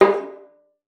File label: VIOLIN